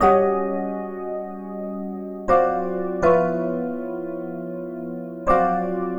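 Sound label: Acoustic Piano; Keys; Keyboards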